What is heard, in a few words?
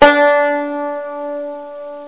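banjo low long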